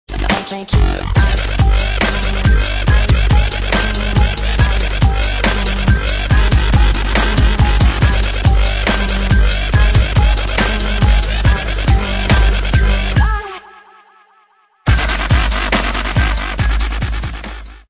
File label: dubstep